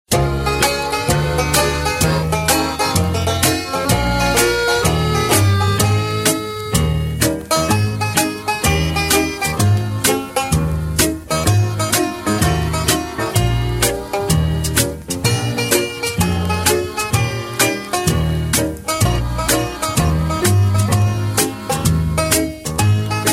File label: music